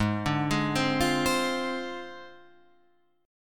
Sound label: G sharp 6th Flat 5th G sharp, chord; arpeggiated; JGuitar